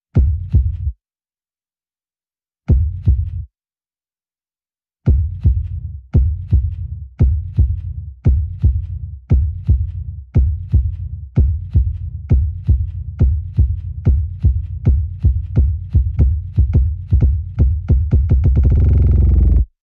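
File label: Timekeeper Blood Pumping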